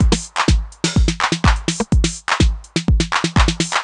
drum ohnine var 125, Drum Loops, Classic Chicago House